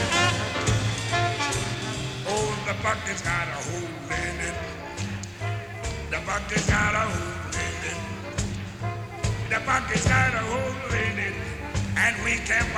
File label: bucket